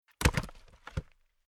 Domestic Throwing Large Log Onto Log Pile Wood Impact Deep Thud Roll; Miscellaneous Impact; Tom Hutchings